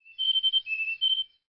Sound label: bird